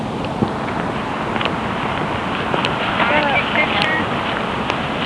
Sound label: whistle; Cemetery EVP